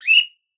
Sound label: Coach Whistle Short